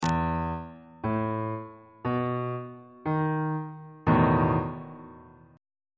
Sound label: f flat major 7th suspended 4th chord on bass clef